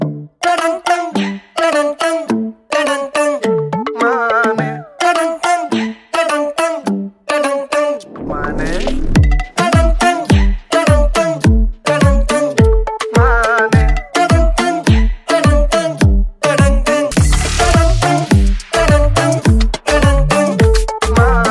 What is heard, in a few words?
Ringtone Tamil Bgm; Joe Movie